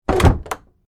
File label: Door Close Cellar, Foley, Door, Close, Cellar, Door, Wood, Planks; SoundEffects-BlastWave